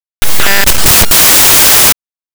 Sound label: glitch